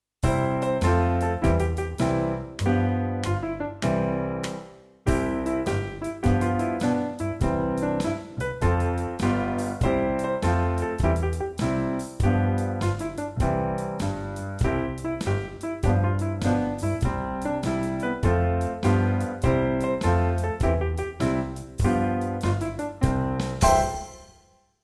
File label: step together step toe 6/8, tap